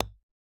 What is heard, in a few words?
Ball Collision Geo Golfball Med